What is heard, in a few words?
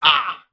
hurt